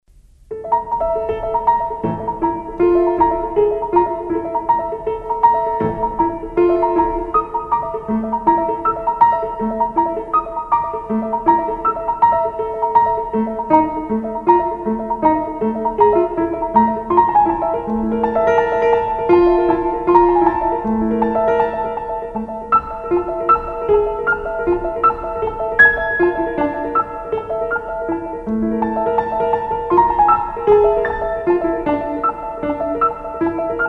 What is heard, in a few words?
Piano